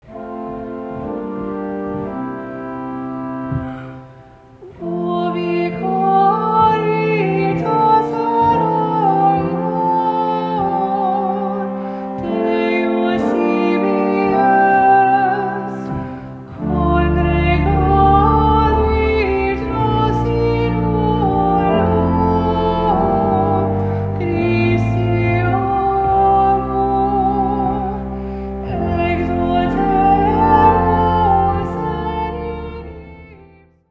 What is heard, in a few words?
Ubi caritas chant; wedding music